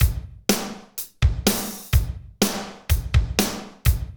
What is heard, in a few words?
Medium Tekno, Drumscapes Roland; Northstar - Drumscapes Roland